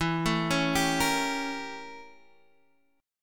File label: A 7th Flat 9th E